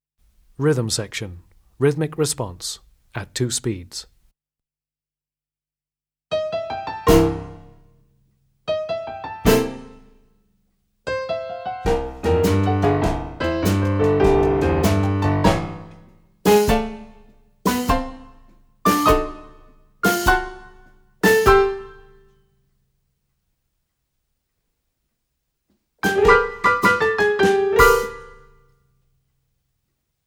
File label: Rhythmic response at two speeds